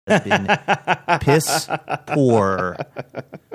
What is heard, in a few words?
laughing saying piss poor